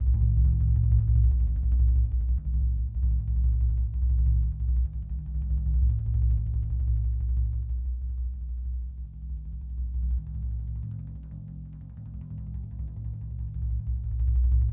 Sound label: Lg Frame, World Percussion